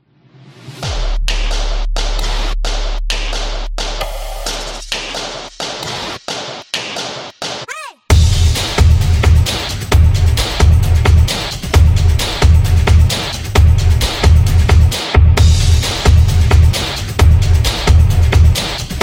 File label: Tennis, sport drums